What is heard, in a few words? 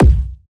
kick oneshot boom, Pop; Drums; Slate; SD PASADENA Pop Sample Pack